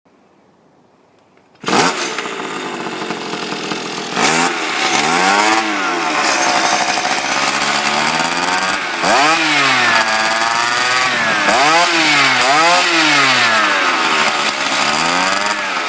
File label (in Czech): zvuk vyfuku